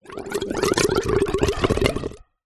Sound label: water pipe gurgle; nature